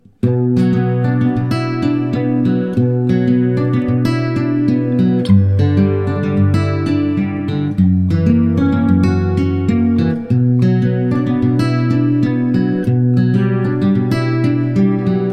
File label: Fingers chorus reverb